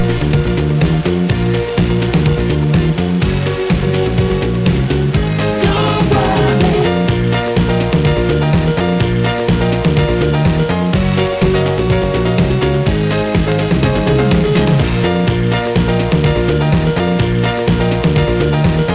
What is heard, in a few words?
sid MOS6581R2; Sanyo; Evolution tune 5